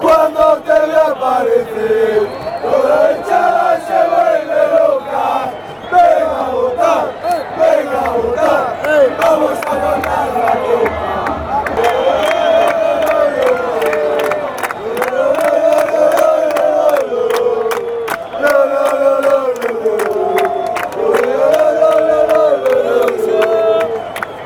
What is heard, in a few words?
crazy, chants